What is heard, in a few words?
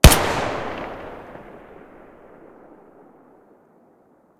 tt33 muzzle mono